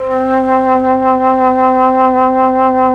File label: FLUT1; ILIO; Propeller Island Legendary M400